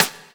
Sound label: SD, snares; EastWest; Dance Mega Hip Hop Electro